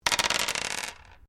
Dice Medium Pair Glass Table Throw; Variety of dice sounds; Casinos

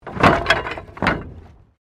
Wood Fall

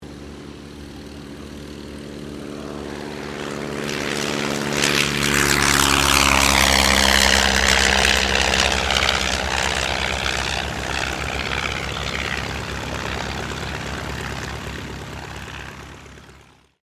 AIRCRAFT AUSTER III TAKEOFF; Airplanes

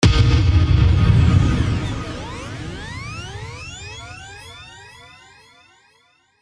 Hit Impact Flange, Hit Impact Flange Delay; Blastwave

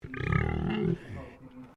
Animal Pig Landrace Inside Grunt

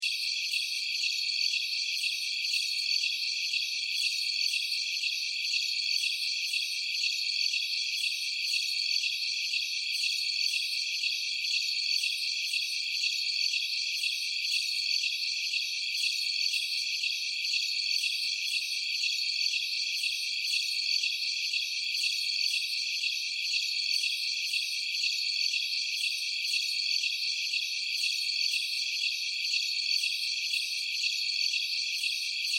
Insect Chirping Bed Background Jungle Night; Insect Voice

